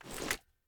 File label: inspect, reloads; gmod